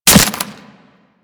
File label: Melee Impact Flesh; Warframe; Kubrow